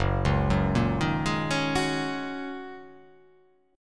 F sharp 6th Add 9th, Guitar; arpeggiated; JGuitar

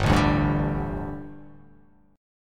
G Suspended 2nd G, down, Gsus2 Chord; strummed; JGuitar

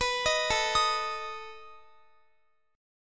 A Suspended 2nd Flat 5th A down Guitar; Asus2b5; arpeggiated

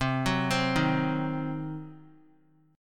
C Diminished C, 3C,3F,3A,3D; arpeggiated; JGuitar

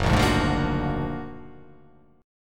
F sharp Augmented Major 9th F sharp

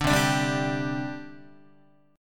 C Suspended 2nd, C, down, Guitar; Csus2 strummed

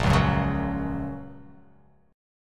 A sharp Minor Sharp 5th, down

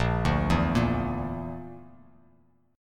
A sharp Major Flat 5th, Bass Standard; arpeggiated; JGuitar

